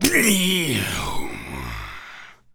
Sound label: Pain, Zombies; zps; Carrier